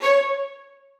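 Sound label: 1st violins staccato C#5; Sonatina Symphonic Orchestra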